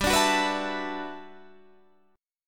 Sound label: G Major 13th, Guitar DADGAD